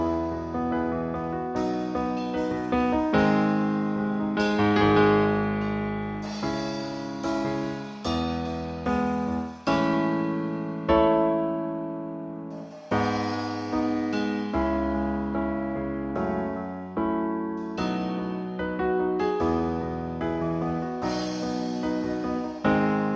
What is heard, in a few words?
Piano Bar